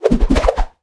Swirl, Comical Effects; 1001 Sound Effects

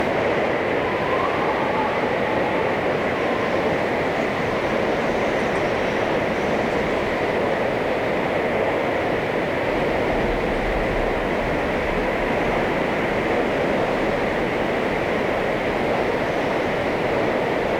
strong cold wind; firefight

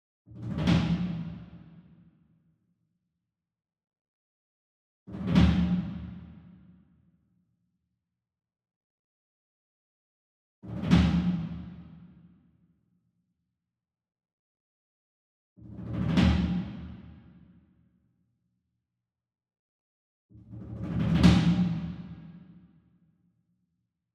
SINGLE DRUM Tom Concert Roll Soft Beater Far; boom library; Cinematic Strikes Construction Kit

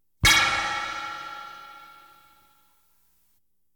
Science Fiction effects, Lasers, Light beams

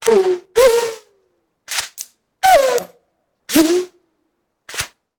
Bullet Pass By; Sound Ideas; Guns

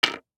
DICE on Hard Wood, Drop, Standard, Four Dice; Samples; ArticulatedSounds; DICE Sfx Party (Extended)

